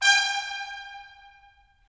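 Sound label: brass trumpets staccato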